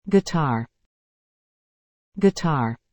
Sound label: guitar